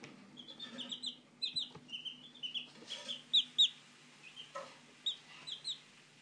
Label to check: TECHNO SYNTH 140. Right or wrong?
Wrong